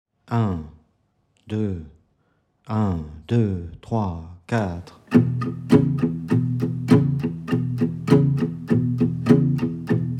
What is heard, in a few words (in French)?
exercice 100bpm binaire